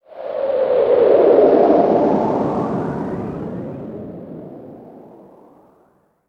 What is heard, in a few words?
windgust, ambient; Garry's Mod; tf2